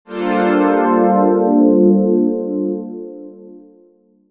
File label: Digi Pads; Jungle Frenzy 1